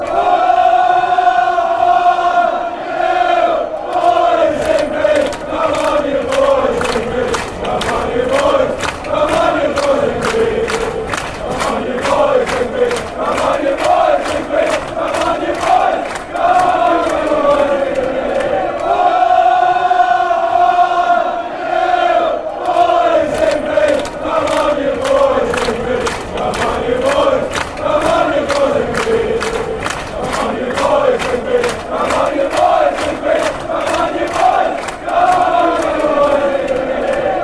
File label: come on you boys in green, chants